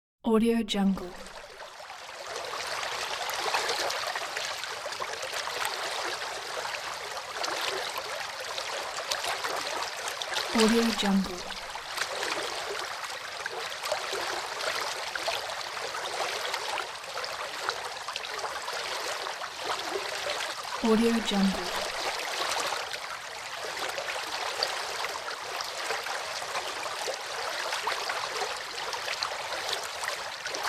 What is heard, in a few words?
lake